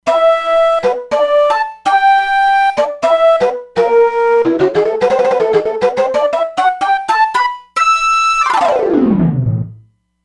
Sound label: Shaku Whistle; Factory patches